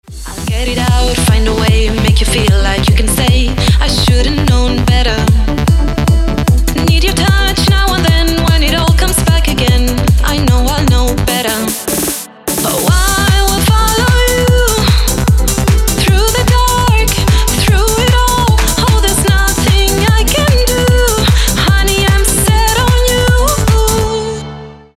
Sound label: hard edm workout, 150 bpm